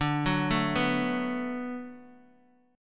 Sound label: D 6th D down Guitar Open D, chord